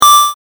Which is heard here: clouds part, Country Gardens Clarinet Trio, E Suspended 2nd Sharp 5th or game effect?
game effect